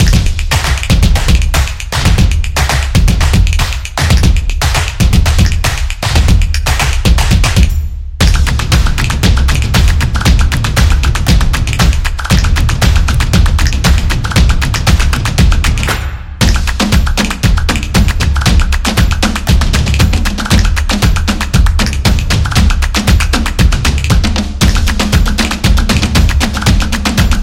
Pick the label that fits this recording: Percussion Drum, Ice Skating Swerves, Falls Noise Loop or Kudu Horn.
Percussion Drum